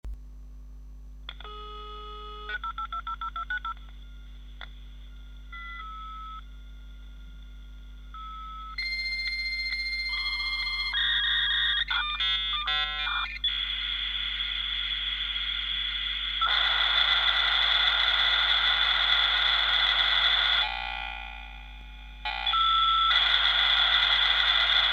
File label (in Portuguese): audio modem conexao discada